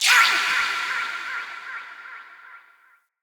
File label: Hallow Spirit Vocal Death; Halloween